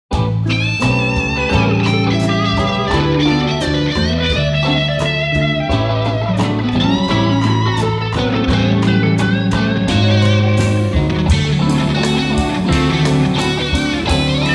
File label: SoloGit + Bass + Dobro + RGit1 + RGit2 + Drums